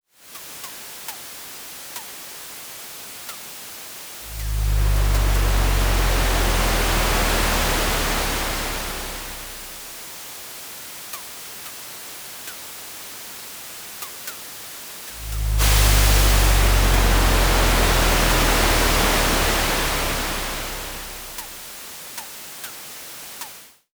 distant thunder soft

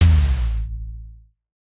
cinematic hook hit

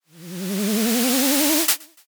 horror whisper reverse

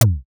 desk item place; daily life

